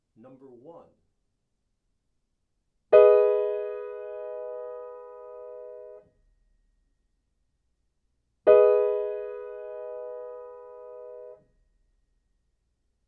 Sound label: chords; eartraining